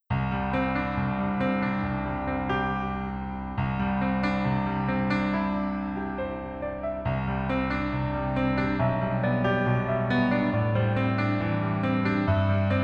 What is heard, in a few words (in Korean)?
Piano ver, 멜로디MR, 여자키; 낭만닥터 김사부